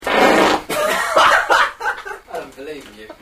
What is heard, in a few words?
Stereo Fart